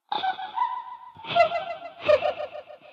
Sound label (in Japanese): 少女の笑い声; misao